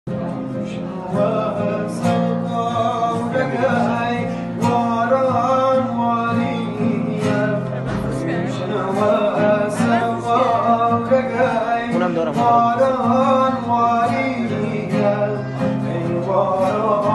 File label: kordi live voice